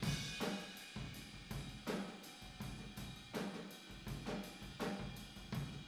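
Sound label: Boogaloo Chorus Room stereo, drums, 82 BPM; Drums from the Big Room The Mixes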